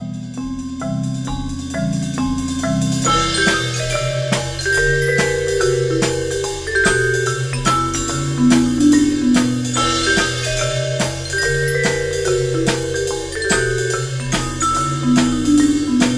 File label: Marimba